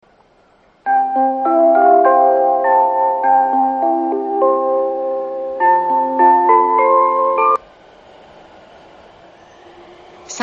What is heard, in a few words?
kuki 3 bannsenn